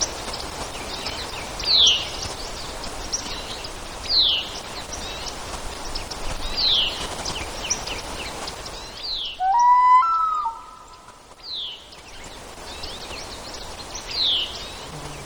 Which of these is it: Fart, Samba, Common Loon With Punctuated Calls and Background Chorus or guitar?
Common Loon With Punctuated Calls and Background Chorus